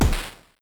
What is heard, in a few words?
punch lowbit heavy